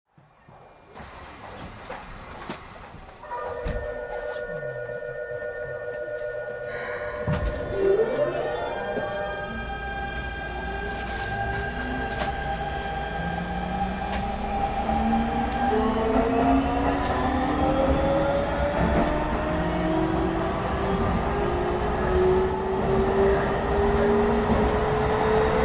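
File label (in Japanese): 電車走行音, ＶＶＶＦインバーター：SIEMENS製ＧＴＯ, ドレミファインバーター, かなり速い加速; 泉岳寺〜品川; 京浜急行２１００形; 泉岳寺始発の快特車内にて収録したものです。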